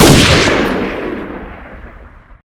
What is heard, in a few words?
weapon; Crowsnest